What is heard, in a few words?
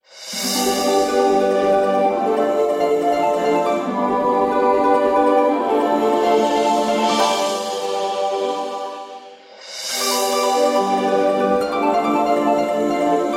Wildwoods Jump (Ascend Descend)